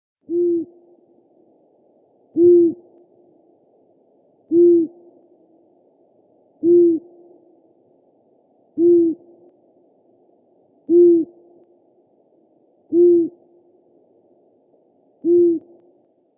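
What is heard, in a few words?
long-eared owl voice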